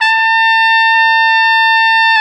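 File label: BRS RCA TP, Brass Trumpet; Roland L-CD702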